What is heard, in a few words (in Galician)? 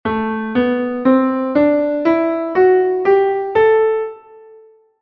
escala